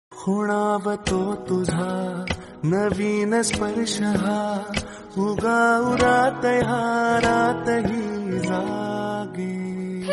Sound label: title song marathi